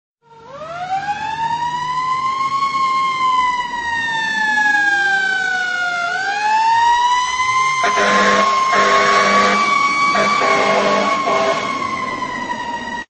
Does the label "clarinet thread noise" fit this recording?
No